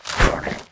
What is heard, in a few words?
Attack; Fitton